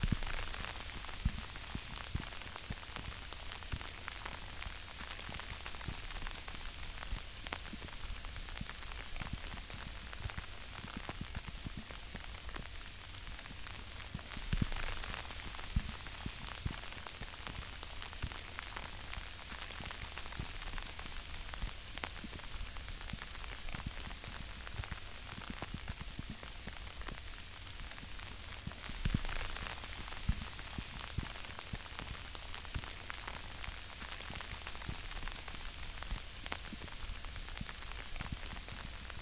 snow sound; czero; weather sound

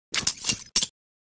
inspect, bowie knife

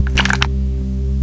plasma gun draw, weapons

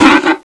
hurt, zombie; Zombie Darkness; hump back

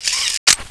gun clip out

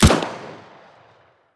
mp5 fire; weapons; csgom; cstrike

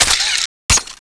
plasma gun clip out

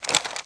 gun pickup

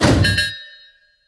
turn reject; fastline; ambience

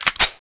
m950 bolt pull

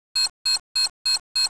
c4 beep; cstrike

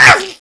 light gut flinch, zombie; Zombie Darkness

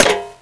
pkm clip out, weapons

